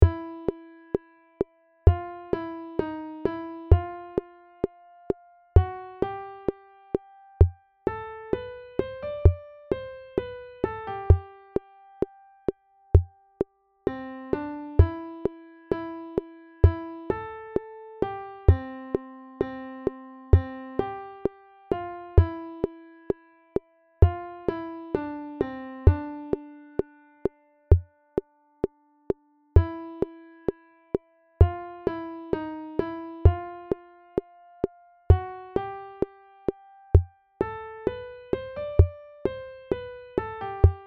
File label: melody metronome c major